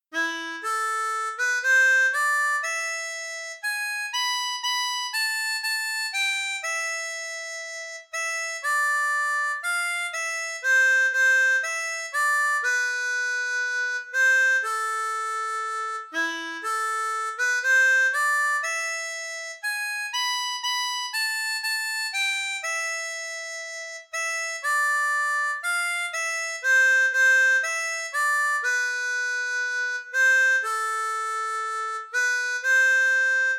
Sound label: Ack varmeland du skona, harmonica; Folkloriques